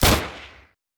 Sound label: MAGNUM44